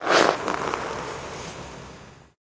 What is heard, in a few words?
taunt skating scorcher stride; video games; source engine; tf